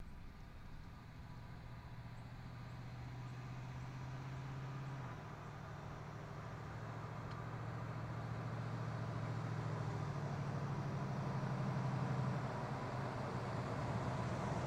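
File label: Blazer Drives Slow Idl, chevy blazer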